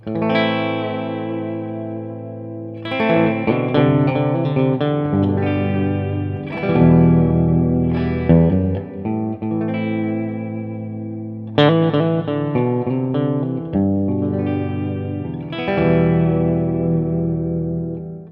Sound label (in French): truevintage clair reverb